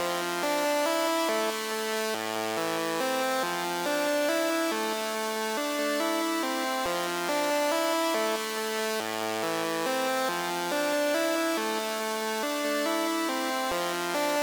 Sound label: 140 BPM A Min Lead; Cymatics - 2022 Melody Collection - Wavy; Vapor